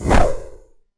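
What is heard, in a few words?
Rocket launch fast; Hovl Studio; SFX Battle sounds; WhiteMan Unity2D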